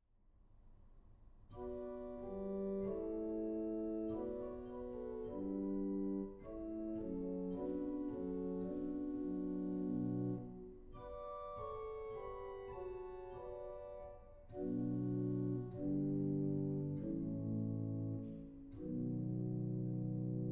Viola 8, organ; goenn

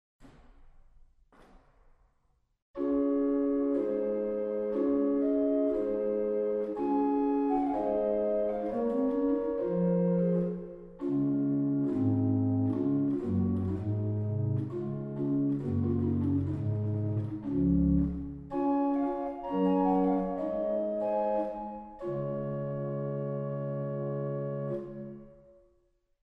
Floete Dupla 8, organ; waltershausen

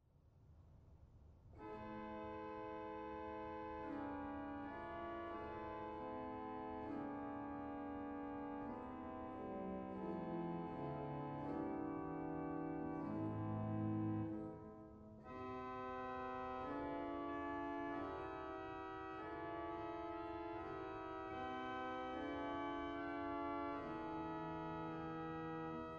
Salicional; dortmund; Sauer

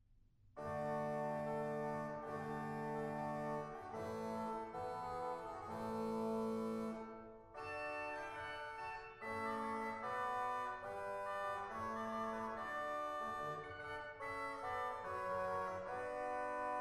Trechter Regal 8; stade

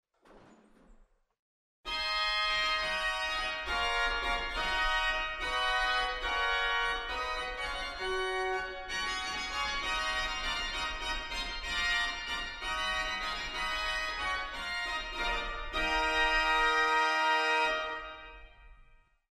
II Mixtura, organ; waltershausen

